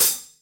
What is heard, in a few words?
bright hi-hat sound a sharp key